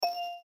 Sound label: Reel Stop; slingo-adventure